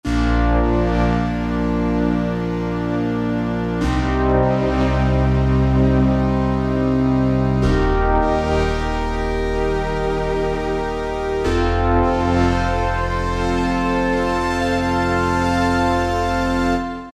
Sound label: Sweep, Yamaha TG33; Factory Presets; Preset bank 1